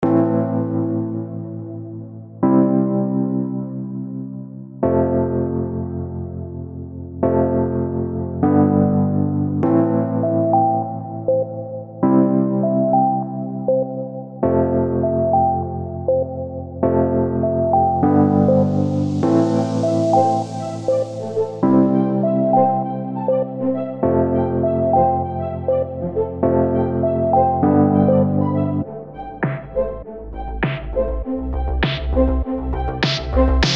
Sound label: audio electro after